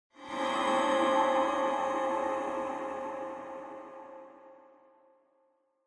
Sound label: Horror Accent High Short